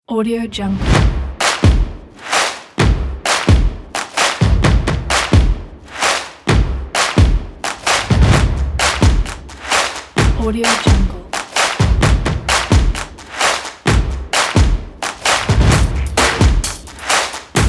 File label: drums